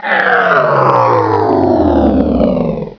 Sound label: die, controller; svencoop; cracklife